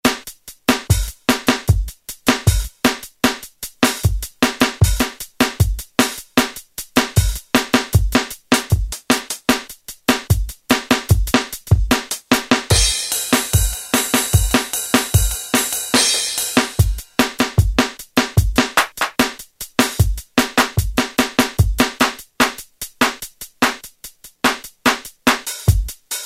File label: Ragga Set, Roland M-DC1 Rhythm Sets